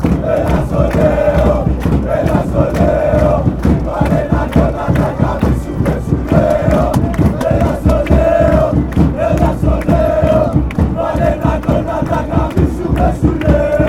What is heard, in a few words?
Greece chant